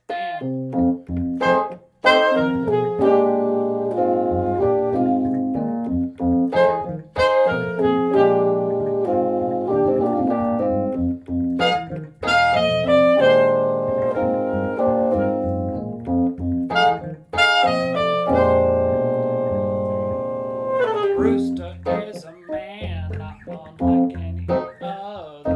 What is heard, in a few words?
Hornline; Rooster